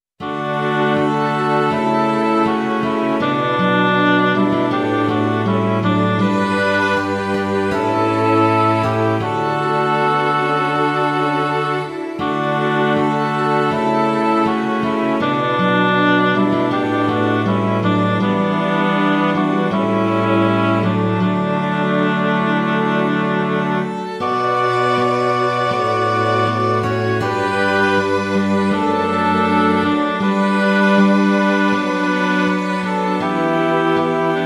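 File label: Oboe Oboe Guitar Bassoon Strings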